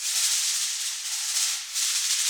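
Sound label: rain sticks; Spectrasonics; Heart of Africa 1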